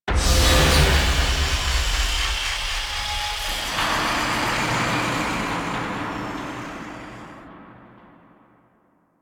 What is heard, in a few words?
Queens Platform Raise; Warframe; The War Within